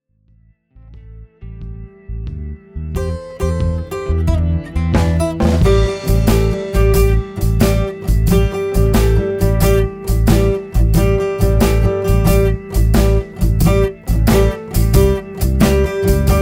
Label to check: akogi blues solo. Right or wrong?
Right